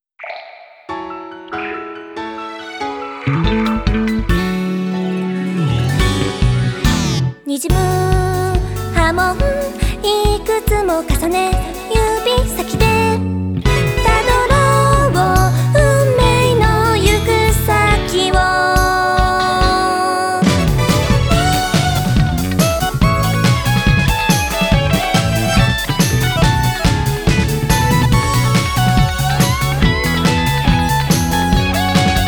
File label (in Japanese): 隠れ傘 Vocal